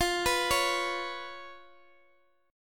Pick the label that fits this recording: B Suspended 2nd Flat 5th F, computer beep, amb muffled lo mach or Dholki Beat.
B Suspended 2nd Flat 5th F